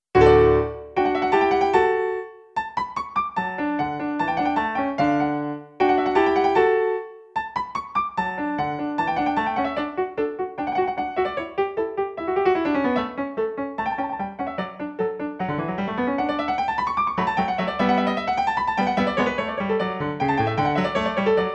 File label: Piano Sonata; Mozart; KV284c